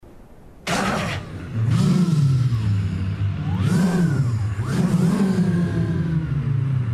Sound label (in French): demarrage